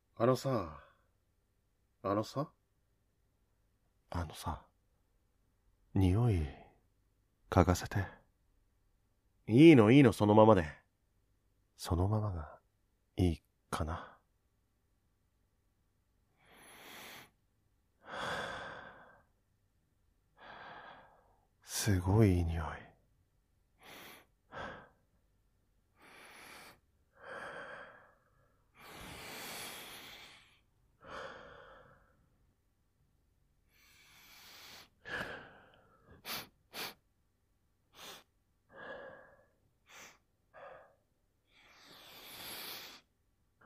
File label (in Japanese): 匂い嗅ぎ; くっさver.